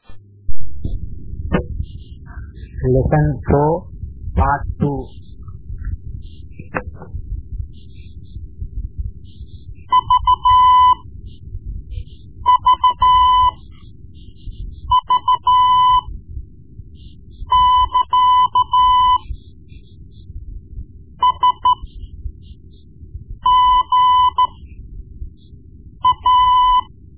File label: Morse Lessons